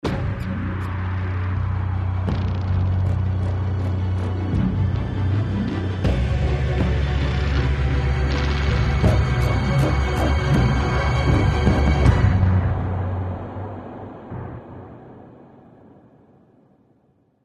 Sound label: Suspense; tales from the borderlands gamerip 2015